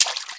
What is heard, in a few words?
Footsteps Wet; Lara; Playable Tutorial Level